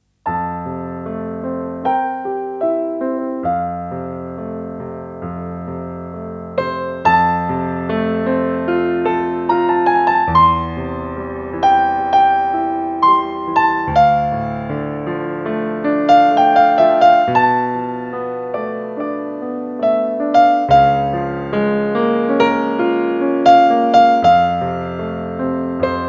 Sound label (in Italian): musica danza